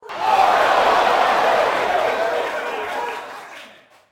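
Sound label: Boxing Crowd Small Reaction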